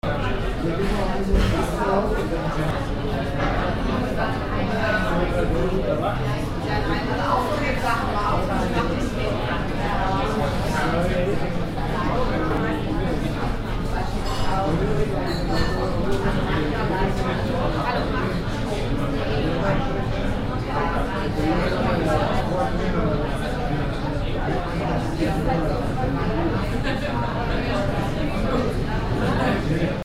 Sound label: restaurant crowd; germany berlin